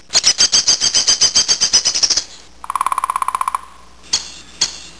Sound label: woodpecker